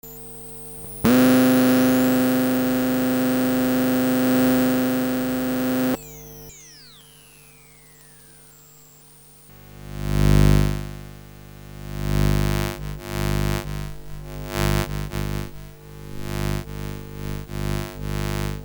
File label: coil pickup rfid